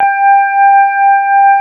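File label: CX3 organ G1 L, Keyboards Old School; USB Soundscan vol.09 - Keyboards Old School; AKAI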